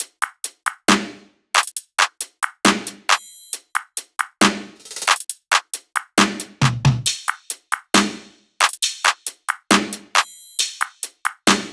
loop top 136; iris; Seismic Loops; SEISMIC2

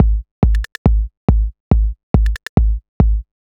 Kick Loop, 140bpm; Zero-G Trance Inducer; Drum Loops 140bpm; 90 s Sample CDs

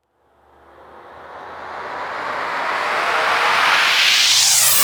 FX Sweep Up

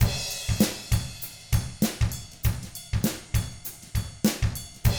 FUNKY, Drums

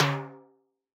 Timbale L, Yamaha DJX 2B

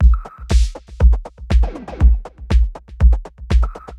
Snow White 120, drums loops; RoBKTA Sample Pack Supreme

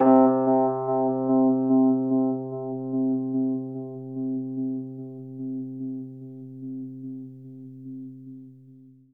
Rhodes octave C, L; 3D Sound; 3D Keys VOL-1

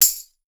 TRASHY, TAMBOURINES; Voice Crystal - Steve Reid Definitive Percussion

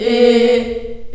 A#2 CHS EH, CHILD EHS, Choir; USB Soundscan vol.28; Choir Acoustic & Synth; AKAI

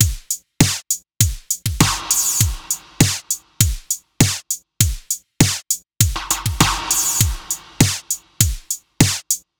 100 Beat; Zero-G; Future Wave; Beats

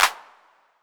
Clap, Roland TR-808; Roland MV8800; TR-808mk2

